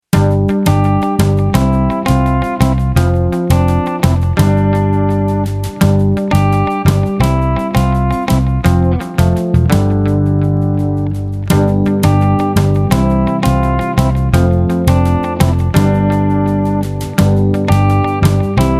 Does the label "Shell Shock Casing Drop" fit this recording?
No